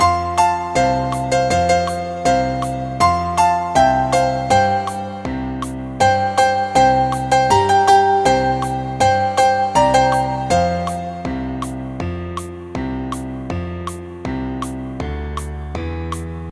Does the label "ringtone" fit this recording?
Yes